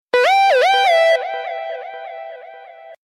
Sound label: Blackberry SMS, Ringtone